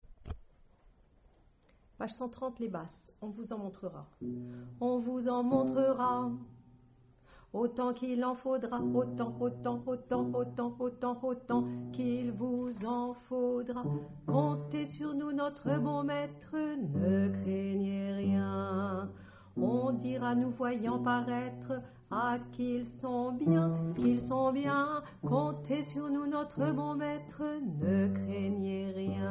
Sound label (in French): Basse